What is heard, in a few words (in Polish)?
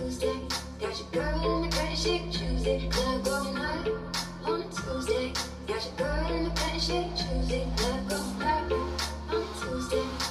elektroniczna poglos